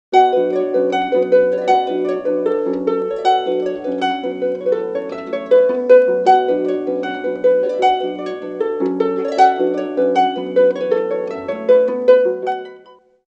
clarsach

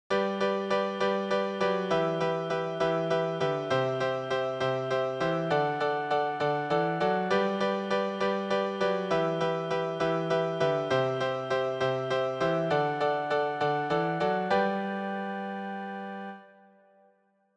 compound time

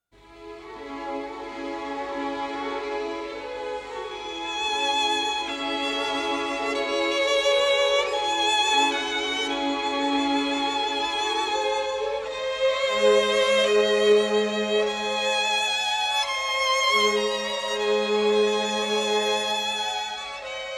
strings

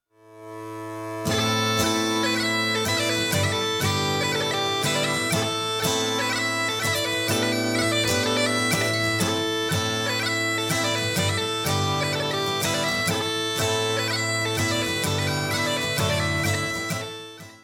drone

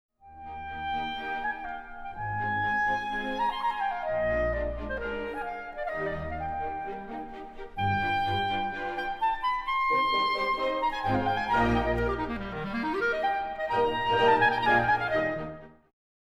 clarinet

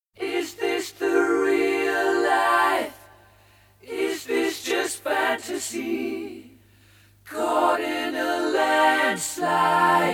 harmony voices unacc

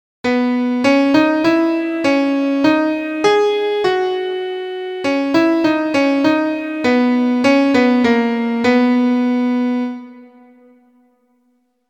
question and answer

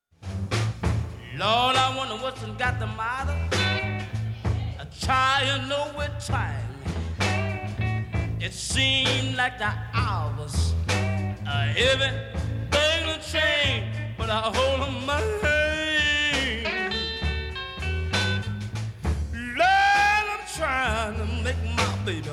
blues

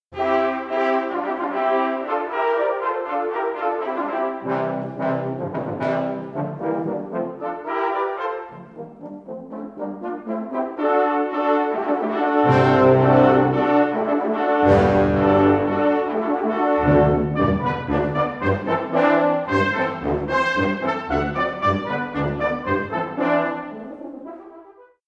brass

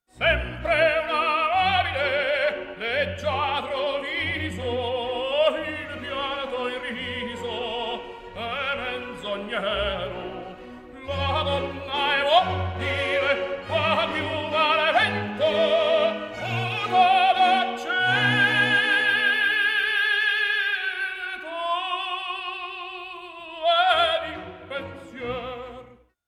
tenor

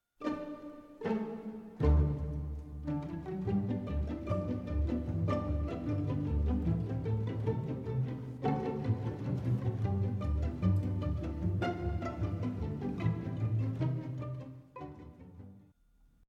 pizzicato

